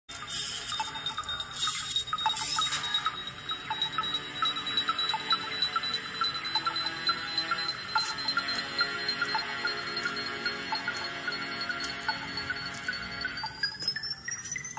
beacon; mandalorian

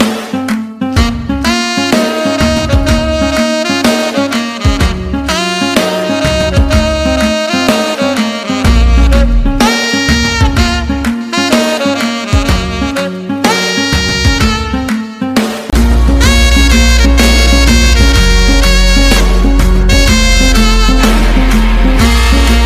saxophone

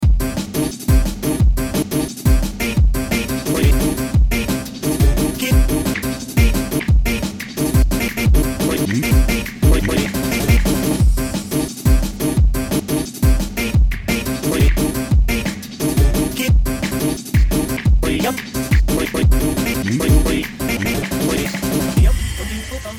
drum bass

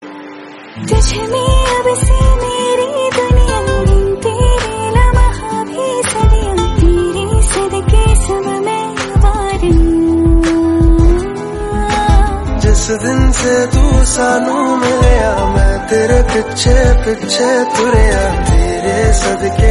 female version

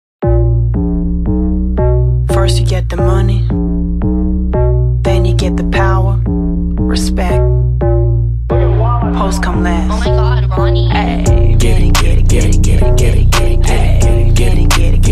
bass drop open up the safe

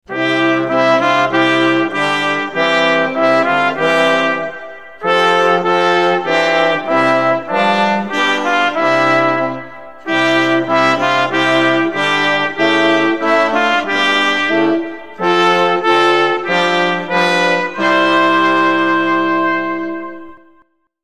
christmas music

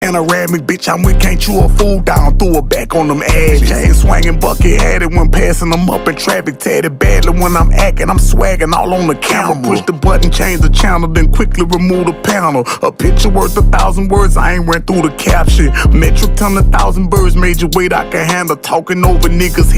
beat box

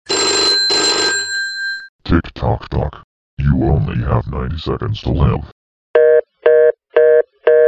tick tock tock

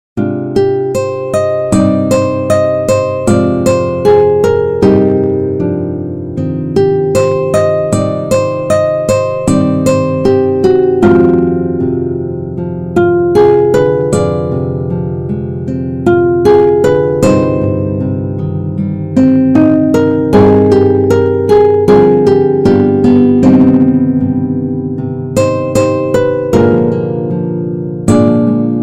guitar

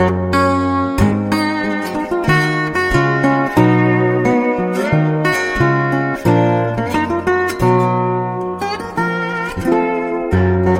guitar

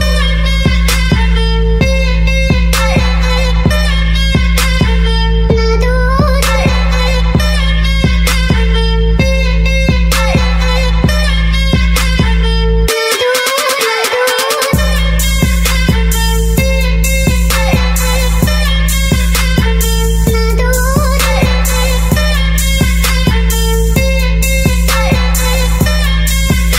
bass

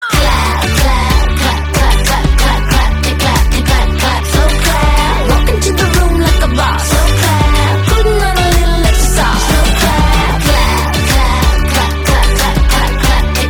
slow clap

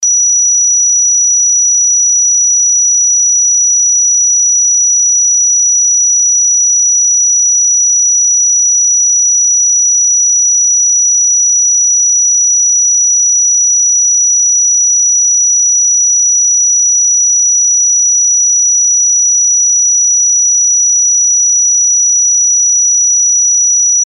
high pitch tone

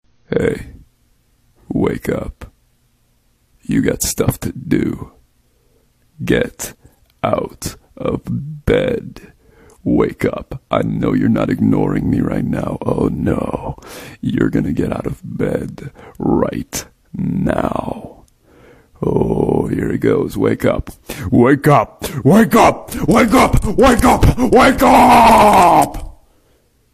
corpse alarm

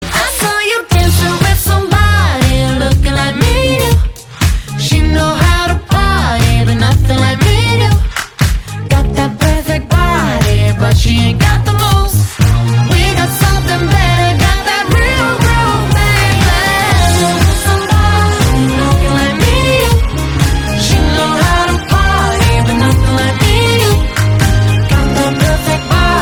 groove

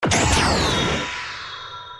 gun; dalek

